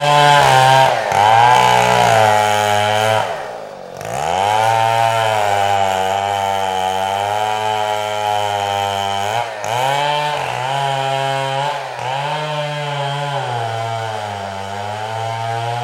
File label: industrial tools chainsaw cutting down tree; sound effects one